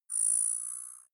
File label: zoom in long